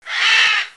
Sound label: porcupine